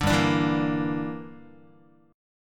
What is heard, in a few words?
G Minor 6th, Guitar; Gm6 strummed; JGuitar